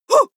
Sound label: female shout short woo; schreie; menschen